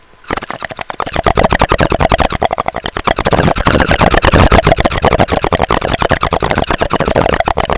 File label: BUBBLE; Messiah; BONG